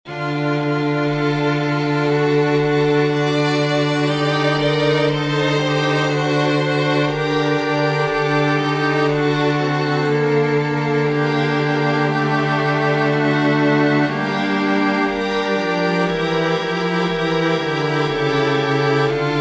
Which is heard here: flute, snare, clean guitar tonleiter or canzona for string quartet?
canzona for string quartet